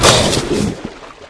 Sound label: snap, ichthyosaur; hl2